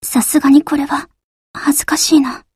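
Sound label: attack; hibiki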